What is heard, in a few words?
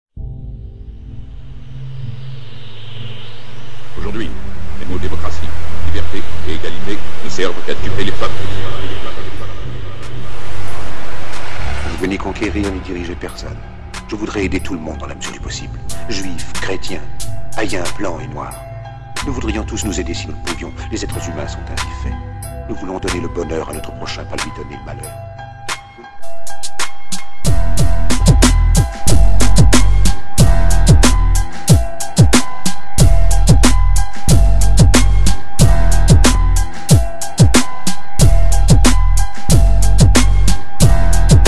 siren